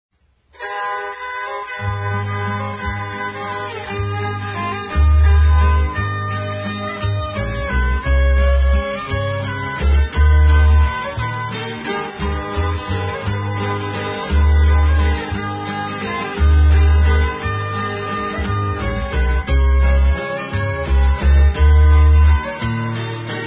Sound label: train whistle